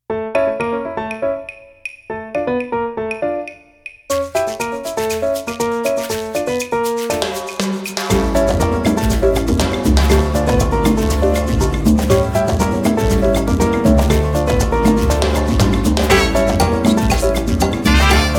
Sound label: Cuba traditional fast; Kontakt sound pack